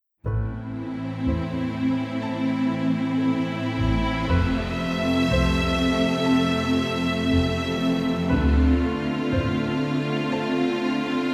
ripples

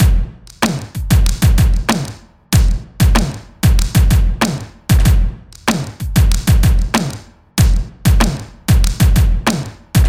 drum loop; monosounds

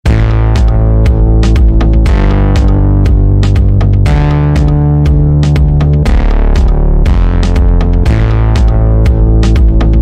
e funk synth

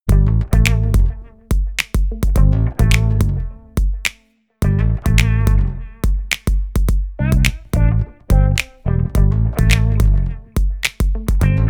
groovy handz

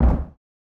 cap stomp; nova sound

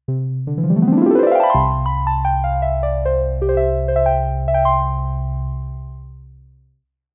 jupiter 8 harp; opxpro3

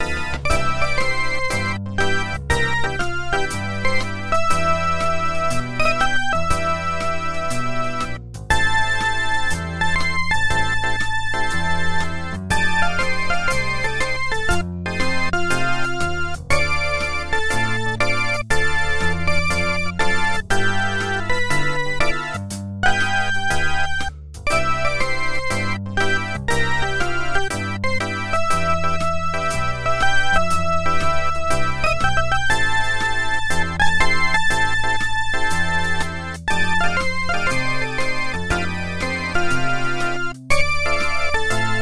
vox jaguar transistor combo

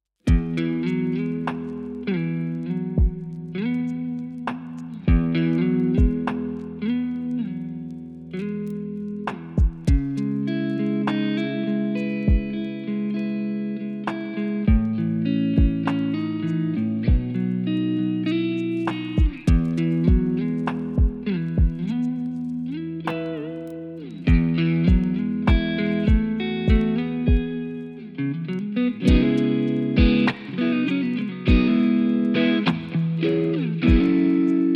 delicate clean

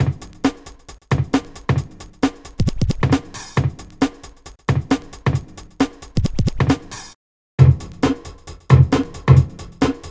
drum loop small rehearsal

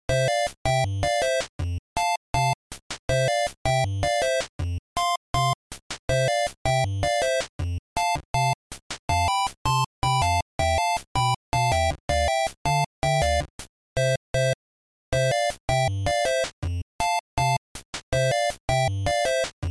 island party 8-bit

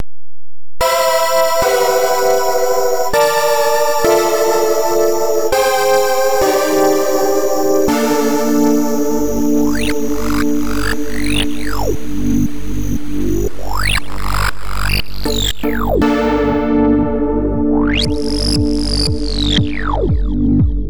groove

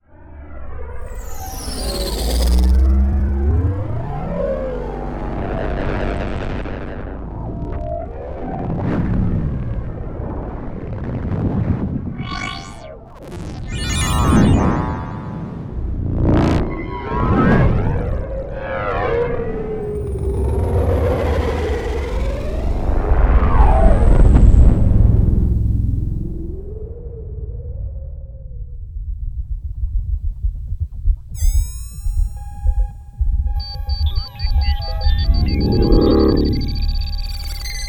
synth scifi short

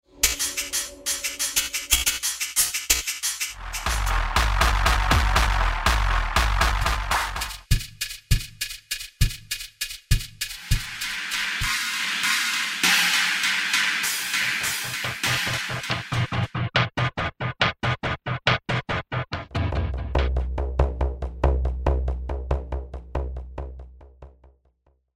dark city percussions

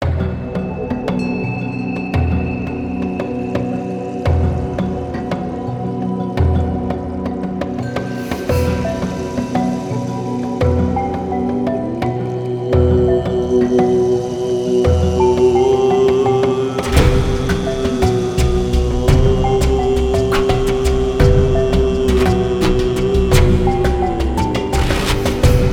forest ritual; forest kingdom

